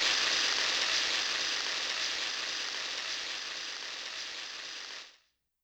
Shower, YAMAHA RM1X

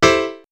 House Piano Chord; X-Static Goldmine; backtothe70's

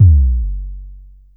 Drum X Sub, Pearl Drum-X

